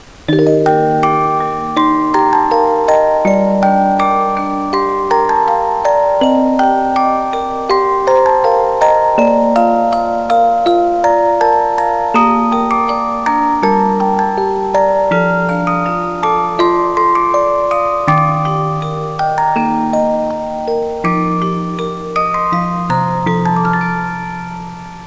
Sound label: Music Box